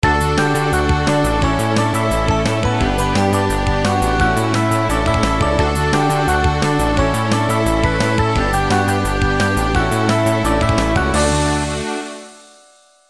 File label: Ending; Twin Spin